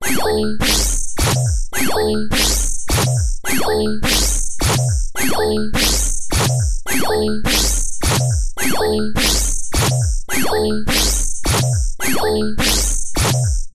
science fiction machine rhythmic operating mechanical